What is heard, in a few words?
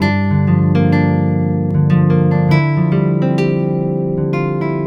Nylon Guitar